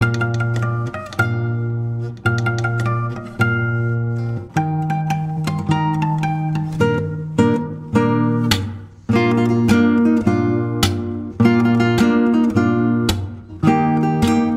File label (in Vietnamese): không lời guitar cover